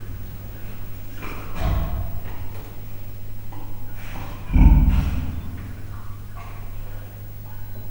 halk horkantások, bengáli tigris; miskolci zoo 2009

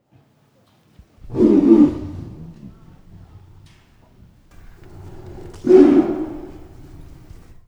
szabadteri esti tigrishazbol uvoltes, bengalitigris; miskolci zoo 2009

